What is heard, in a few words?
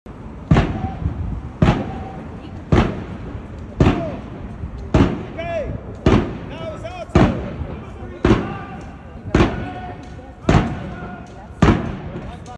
Parade of soldiers